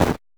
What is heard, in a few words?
footstep snow; Kenney's Sound Pack; Impact Sounds